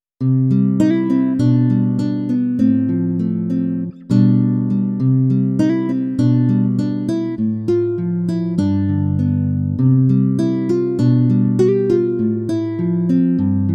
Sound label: M3 Super Stereo